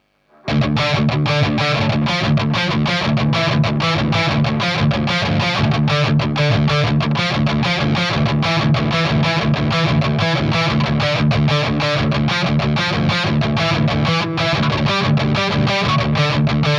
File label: drop d reamp IRT60 SM57 V30 cone 3cm; dominion; overdriven